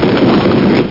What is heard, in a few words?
fire